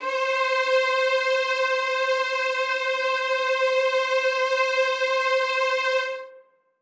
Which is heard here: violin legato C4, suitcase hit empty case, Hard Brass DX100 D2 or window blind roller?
violin legato C4